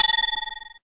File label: amulet drop